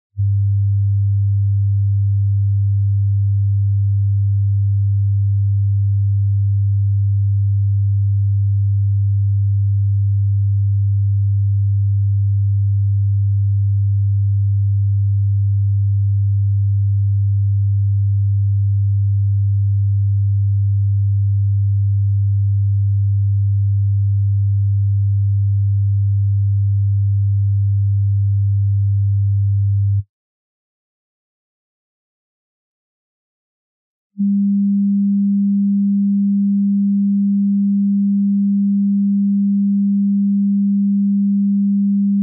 Interferenz im Raum

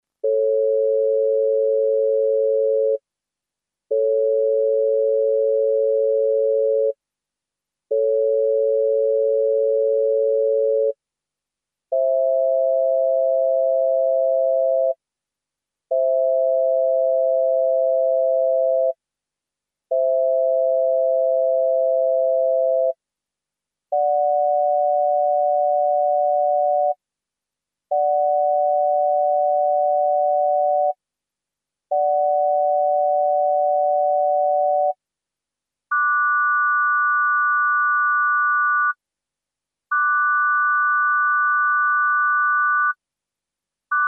terzenvergleich

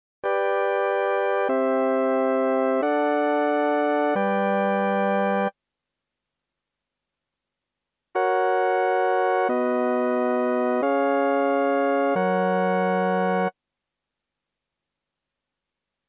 stimmungen fuer alle tonarten

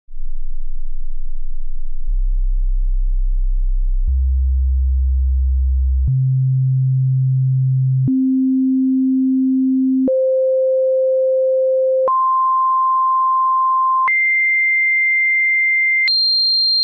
sinustoene

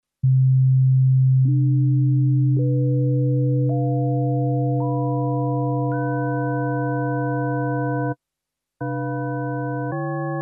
tongemisch

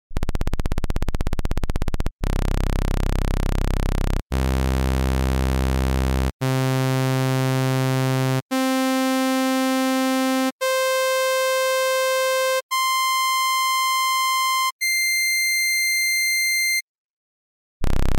periodische Schwingungen

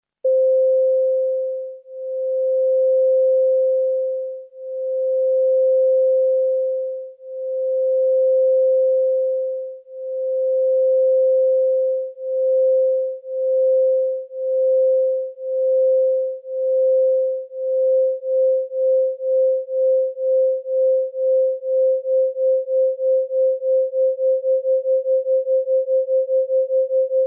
Schwebung

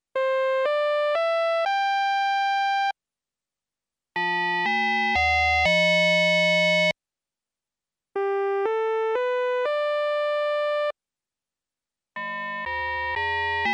ringmodulator

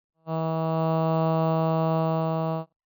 additive synthese

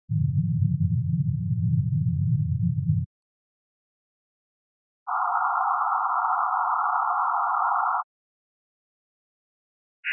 oktav rauschen

